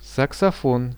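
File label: saxophone; саксофон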